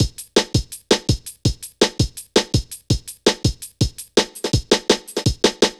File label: Skippy B, Drum Loops, 165.5; Zero-G Jungle Warfare